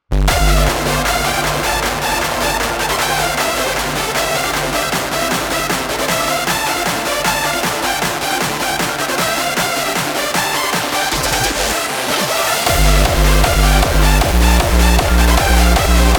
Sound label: Synth, kick, Sample test